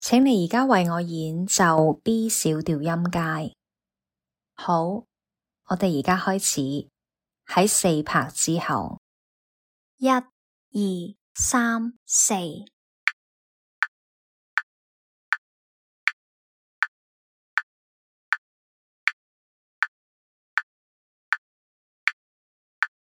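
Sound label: B minor scale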